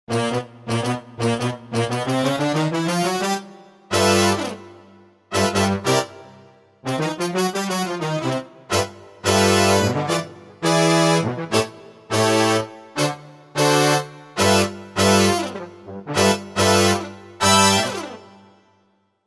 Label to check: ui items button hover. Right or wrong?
Wrong